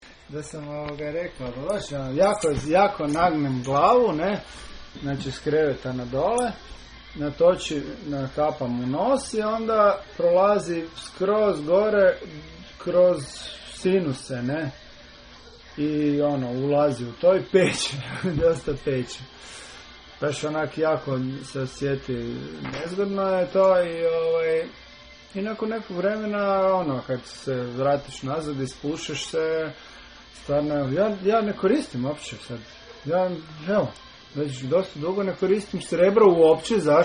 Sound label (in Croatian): Sinusi; IONSKO SREBRO